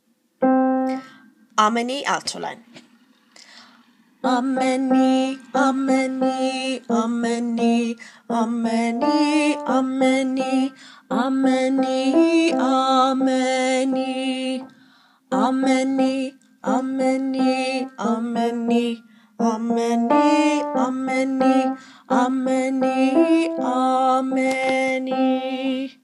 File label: Alto